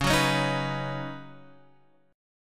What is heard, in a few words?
C sharp 7th Suspended 2nd Suspended 4th, C sharp, down; strummed; chord